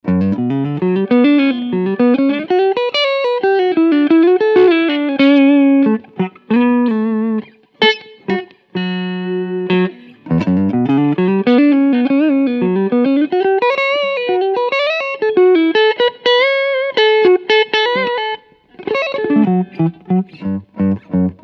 Clean Picking Front PU

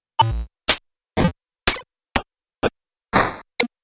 Chip Kit; KOPRO+

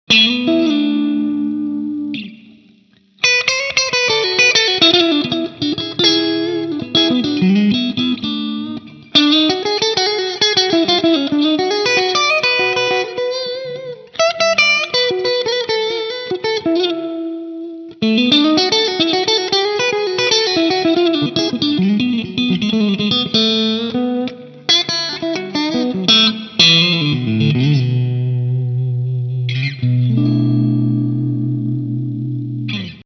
Valeton GP 200 Fender Deluxe Clean

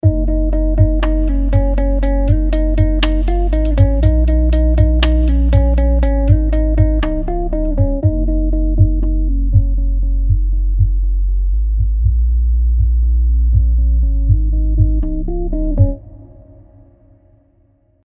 Rhythm 12 db Sallen Key Warm LP; FilterShaper Core 2

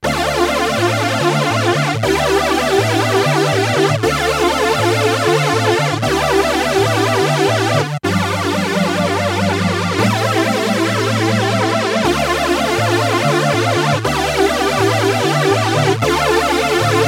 Sawtooth Synth Flanger; MooereladyFlanger

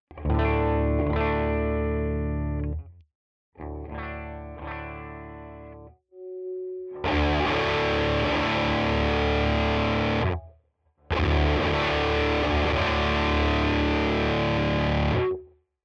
Distortion Asymm; LifePedal

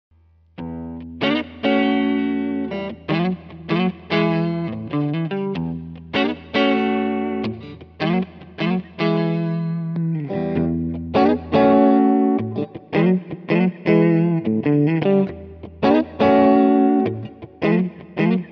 Carl Martin PlexiRanger Clean

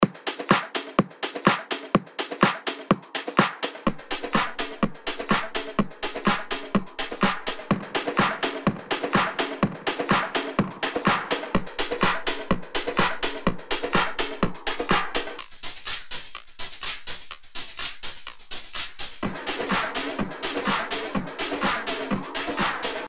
Drum Loop

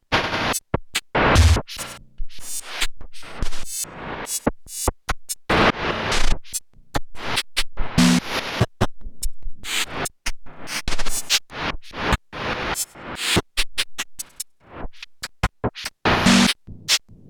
Erica Synths Perkons HD 01 Voice

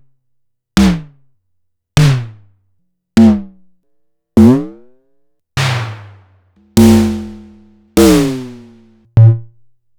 SDS 1000 Hi Toms

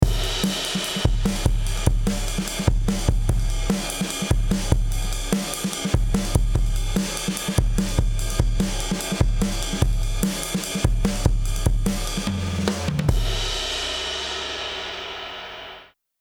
Drums; Chandler TG 1; gr 16db

